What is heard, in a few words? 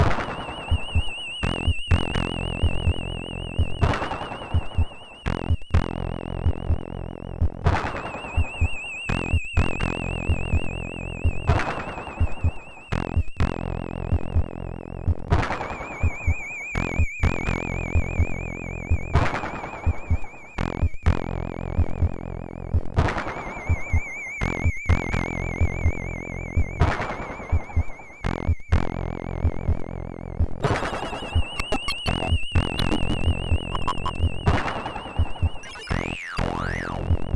Oriental Music, sid MOS6581R3